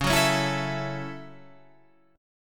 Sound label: F sharp Minor 7th, guitar